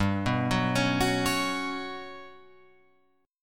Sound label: G Major 7th Flat 5th, Guitar Open F; arpeggiated; JGuitar